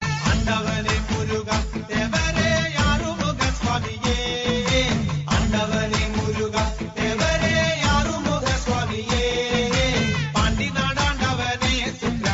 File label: God Murugan Malayalam Ringtone; ringtones